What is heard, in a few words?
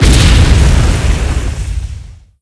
Redeemer Destroyed; Monster Hunt